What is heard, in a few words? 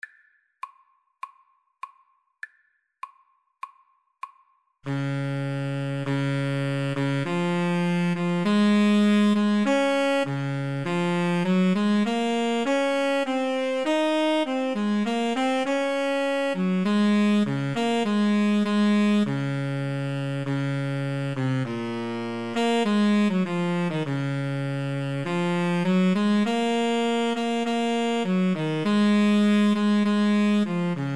Overture, Tenor Sax Duet